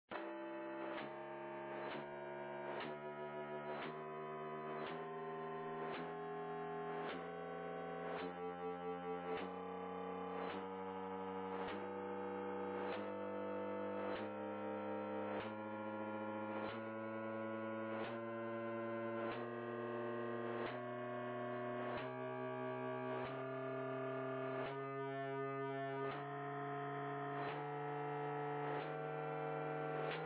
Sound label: VX-7R PL filtered